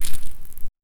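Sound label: stone on stone quiet scrape; underwater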